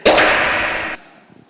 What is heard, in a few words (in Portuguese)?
som do chicote